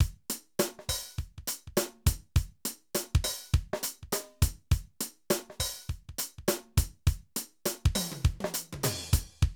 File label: Drum loops, 70s funk